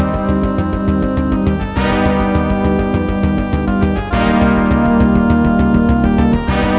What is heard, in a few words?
music; Super Games Pak (1991)(Odyssey); spacewar